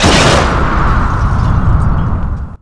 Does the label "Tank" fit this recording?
Yes